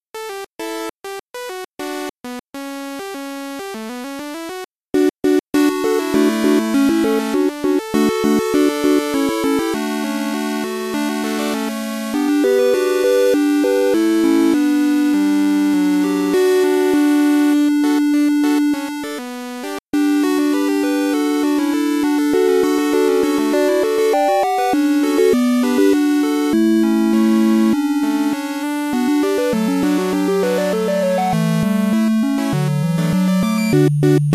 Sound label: square Saw